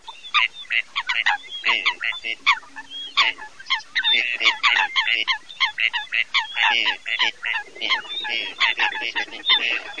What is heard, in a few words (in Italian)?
FOLAGA CANAPIGLIA